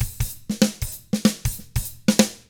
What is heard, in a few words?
95 funk, drums; ILIO; Double Platinum Drums 1